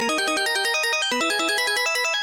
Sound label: start Increment